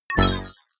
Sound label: sci-fi notification negative